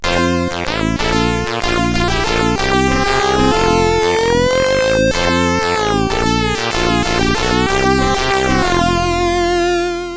Spook, sid CSG8580R5

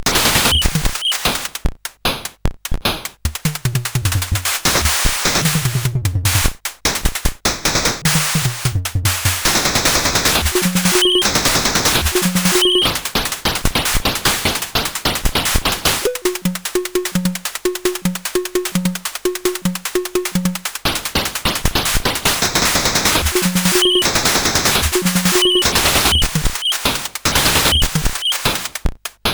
sid CSG8580R5

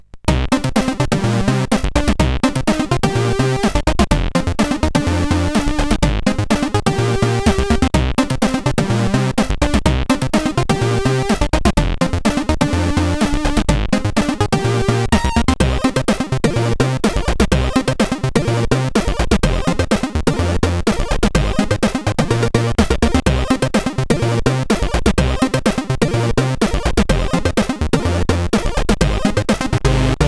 Small Funk, CSG8580R5

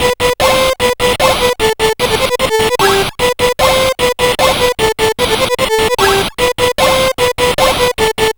Intro Tune, sid CSG8580R5

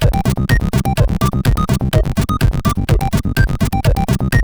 Jump, 8580; CSG8580R5

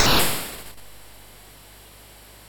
sid MOS6581R2, GAMES; Face Off

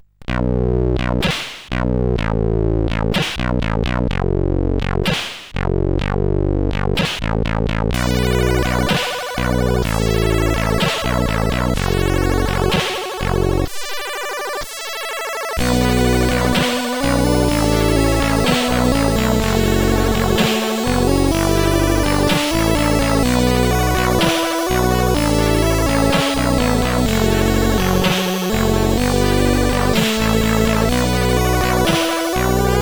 End Tune sid CSG8580R5; Bluez

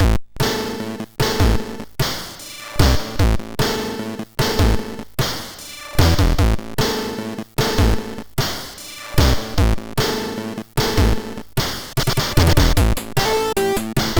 MOS6581; MOS6581R4

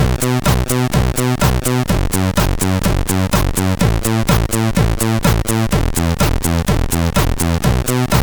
Bassis, CSG8580R5; sid

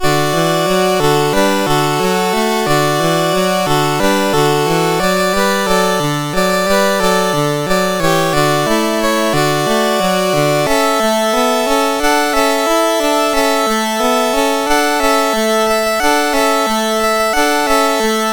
Hallelujah Chorus, MOS6581R2

